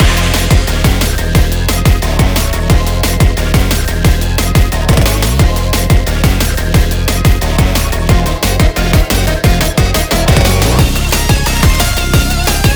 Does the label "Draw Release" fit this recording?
No